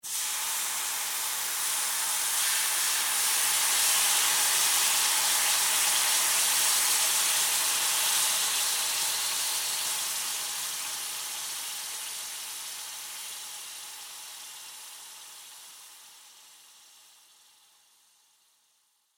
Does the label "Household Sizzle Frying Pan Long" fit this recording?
Yes